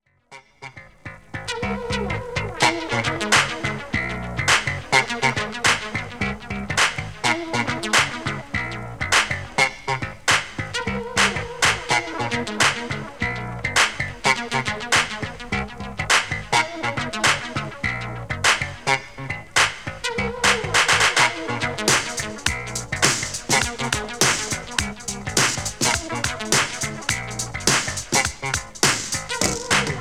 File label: PAD